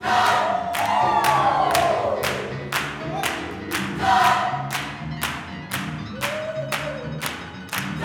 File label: CIR JOY, Vocal Planet Gospel; Spectrasonics; Vocal Planet Vol.1 Gospel